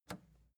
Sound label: record player power push off